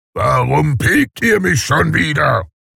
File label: grunt; warcraft; reforged